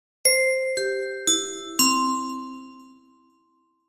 info

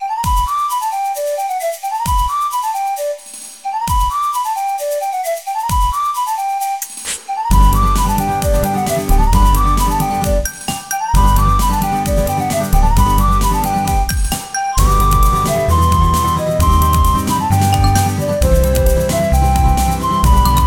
Pleasant Folk dance

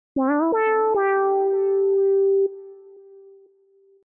Lose power synth

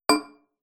select button

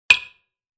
cute; piko

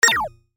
retro game

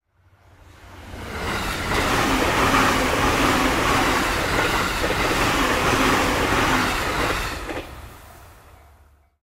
Train passes

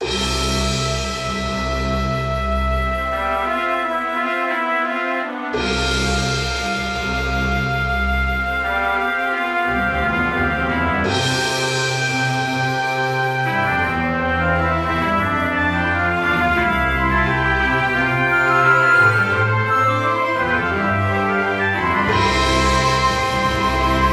grand orchestra

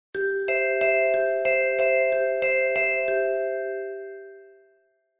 Music box

